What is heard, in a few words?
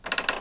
Creak Wood Floor